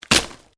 Branches Dead Snap

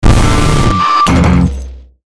Stinger Drum Guitar; Production Element Imaging Element Accent Transition

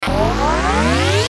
Ascend Rough Low Power Up; Blastwave

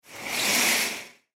Hospital Curtain, Hospital Bed Curtain Slide; Emergency

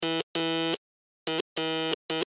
Morse Code Ar End Message; Blastwave; Technology

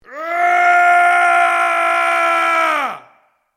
MALE ROAR AGGRESSIVE LONG; Yelling; Voice

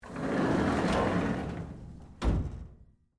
Cabinet Drawer Open, Metal; Industry, Theatre, Gels

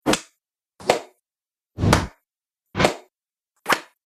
Punches With Swoosh; Punch And Hit Sound Effects

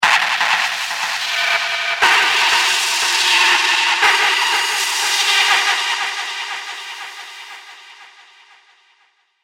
Electrical Static Impacts

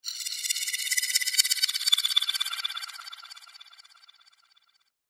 Creepy Chatter Ambience; Evil Sound Effects; horror sound effect

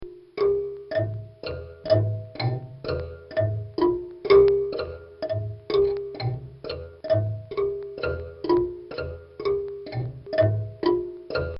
Marimbula Cuban Instrument; Christmas (Sound Effects:Seasonal)

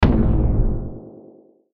Hit Warble Descend Ring; Warbles; Blastwave

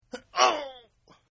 Grunt; Grunts; Sound Effects:Voice; Partners In Rhyme

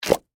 Suction Cup, Industry, Suction, Cup; Yard Tool; Tools; BlastWave

